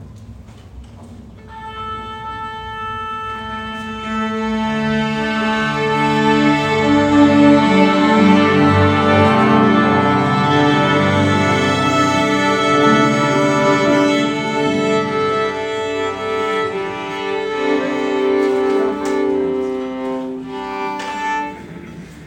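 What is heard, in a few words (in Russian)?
оркестр настраивается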